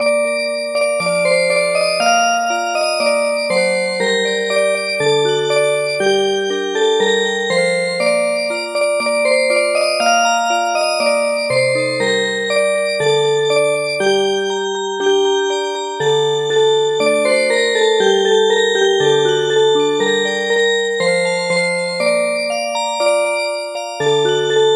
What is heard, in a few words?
Music Box; Ukraine has not yet perished